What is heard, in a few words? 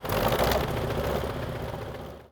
start engine, engines; World of Tanks